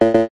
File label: multimedia game sound error incorrect